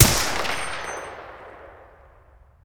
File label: silence; reapr; tacz; RFP v1.0.0 beta2